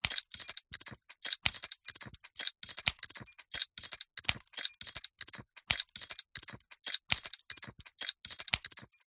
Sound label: science fiction steampunk gears turn fast; zapsplat